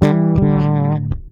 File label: C LICK, PEDULLA BASS; Masterbits CLIMAX 7